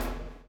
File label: CHAIN; Spectrasonics - Backbeat